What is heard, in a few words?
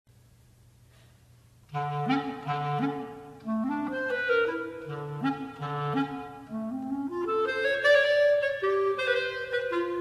Solo Clarinet, Allegro con spirito